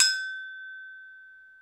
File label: Brzl Agogo; Club-50 - Foundations Roland; Agogos; Fiji